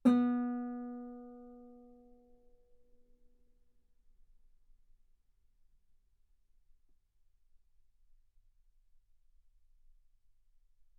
Guitar B3; ff; sul B

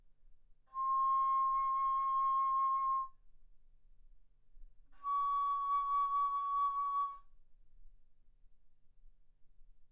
Bass Flute ff C6 Db6

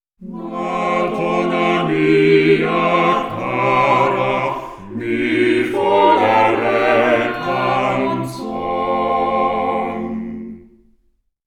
quartet; OmniSource

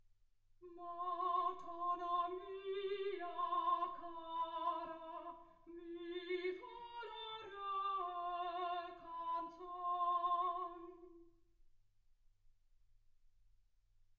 soprano

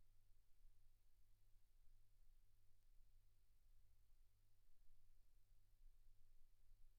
silence 48kHz

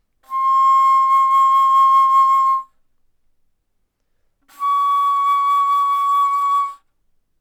Bass Flute C6 Db6

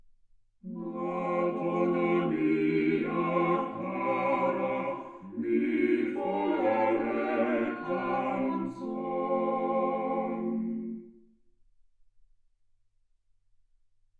quartet

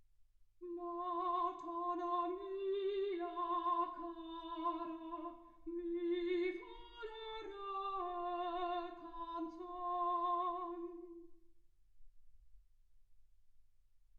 soprano

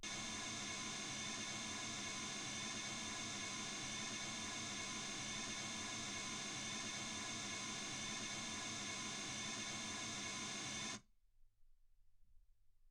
mls 48kHz

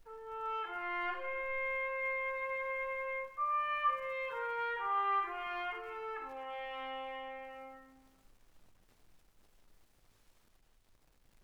trumpet

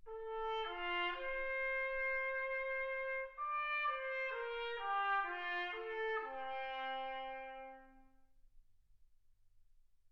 trumpet

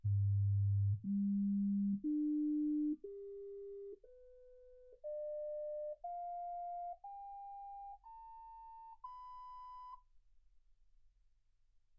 sinus tones 48kHz

